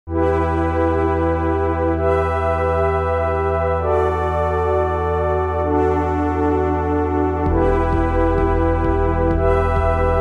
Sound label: signal